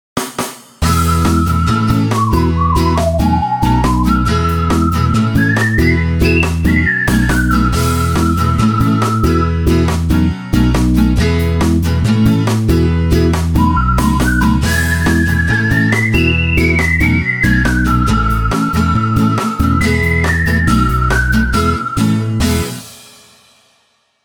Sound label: asadao imitated inst